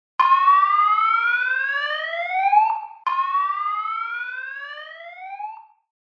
slow whoop fade

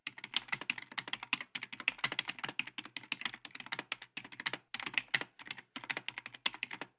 keyboard writing

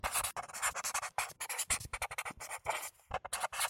writing sharpie

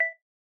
beep positive 1x

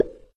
pop low

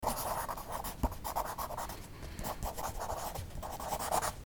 writing on paper sheet with pencil; envato

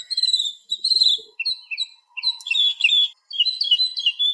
bird chirping

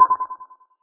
bleep radio wave

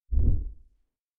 woosh middle

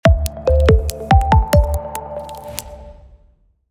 logo intro; envato

